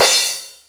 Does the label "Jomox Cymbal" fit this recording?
Yes